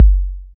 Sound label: 808 Kick; Novation Drumstation; Novation DrumstationV1